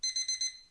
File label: alarm